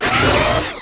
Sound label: Scifi Power Up; Energy & Forcefields